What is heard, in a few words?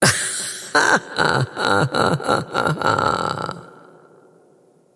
wolf laugh; dota; crystalmaiden